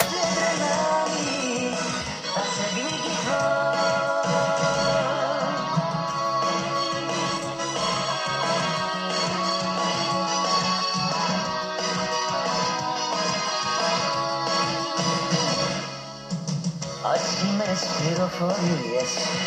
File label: transition break segment